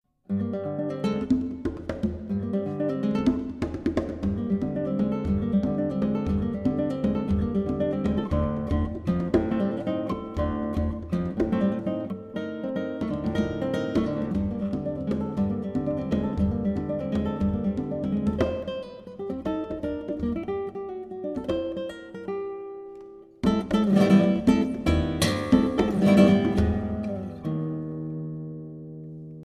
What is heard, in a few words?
danza